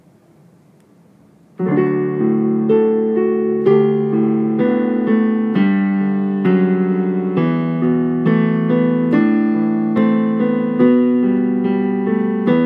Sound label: american anthem baritone just piano